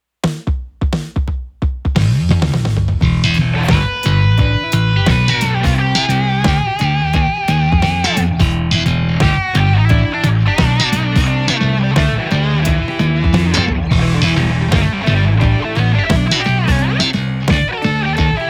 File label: Slap; Alusonic Custom Shop Django Deluxe 4 NT GN